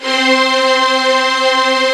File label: BIG STRINGS; Kid Nepro; Elite